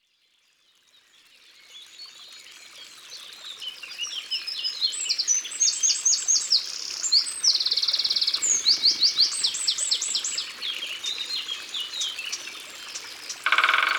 Troglodyte Mignon; Ardeche; Concerts Naturels De l'Ardeche

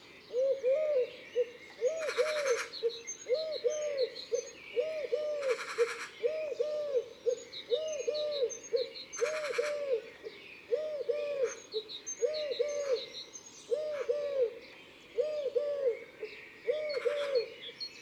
Tourterelle turque, ABC des chants d'oiseaux

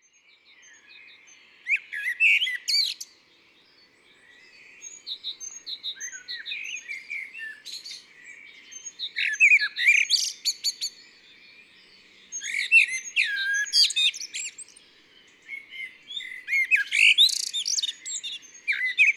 Merle noir; Concerts Naturels de la Drome; Studio les Trois Becs

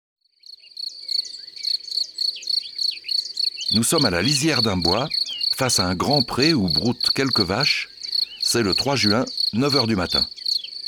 Grillon des champs; abc de la nature

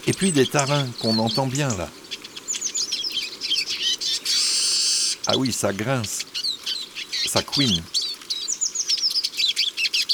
Mangeoire Le Tarin des aulnes

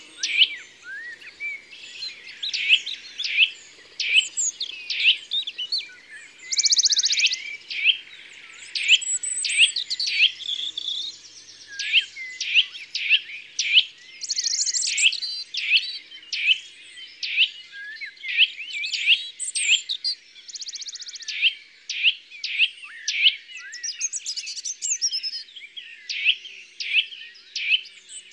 Sittelle torchepot, ABC des chants d'oiseaux

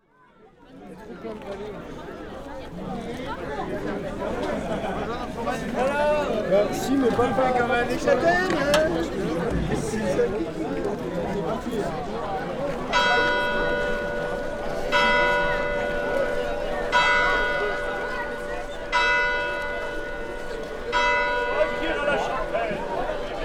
Fete de la chataigne et fanfare, Ambiances Sonores d'Ardeche; fanfare du Bus Rouge; Antraigues

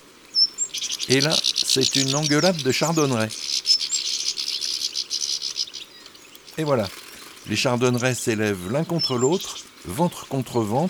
Mangeoire Chardonneret elegant

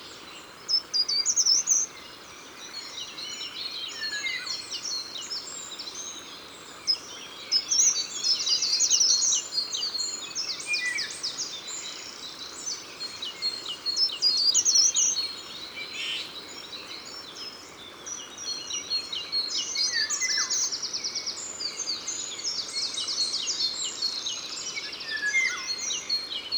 Grimpereau des jardins; Concerts Naturels de la Drome; Studio les Trois Becs

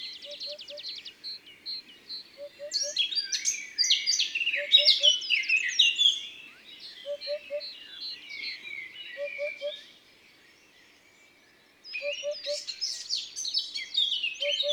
Huppe fasciee ABC des chants d'oiseaux

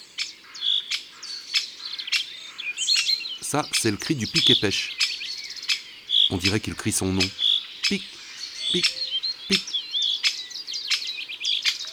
Pic epeiche cris ABC des chants d'oiseaux

